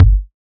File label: warm sounding kick drum single hit g key, bass drum single hit